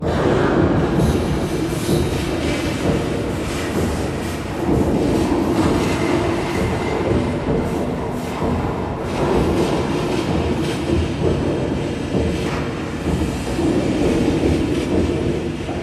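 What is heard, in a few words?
Ambient Descent Loop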